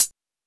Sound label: crispy hi hat D key; drum single hits one shots; hat samples; Duration 0:01; Samplerate 44100 Hz; Channels Mono